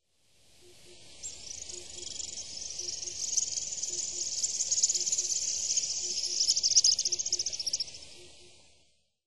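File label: White-throated Needletail, doto; koe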